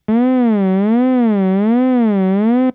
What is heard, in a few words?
Nord Lead 4 vib